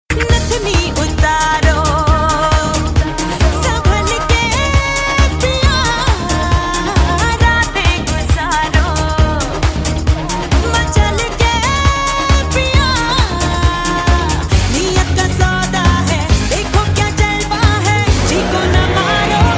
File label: Horn; Ok Please